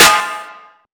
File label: snare